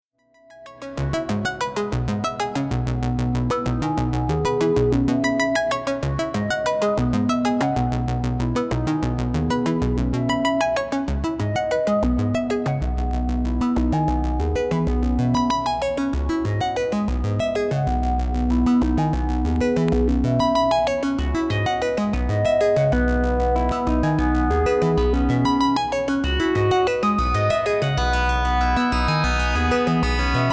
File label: modular